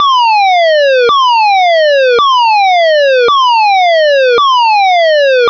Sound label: tone